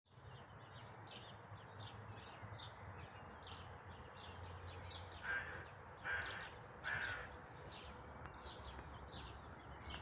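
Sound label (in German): Dawn Chorus, Vogelgesänge vor Sonnenaufgang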